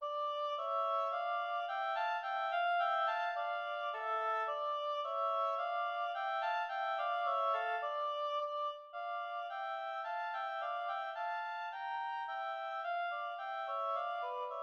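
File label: tambourin for two oboes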